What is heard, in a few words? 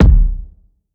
Kick E